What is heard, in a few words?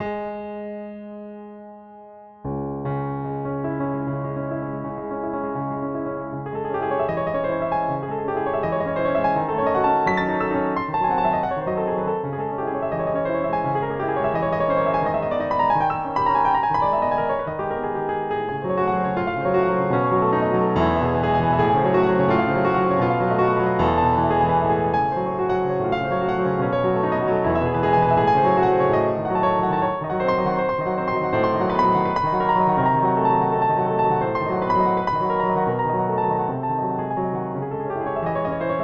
piano; chopin fantaisie impromptu